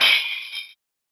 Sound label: gashan glass